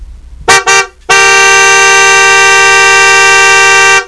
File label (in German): toeffhorn